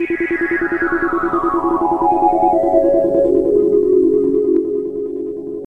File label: E, Drum & Bass Pads; Total Drum&Bass; 90 s Sample CDs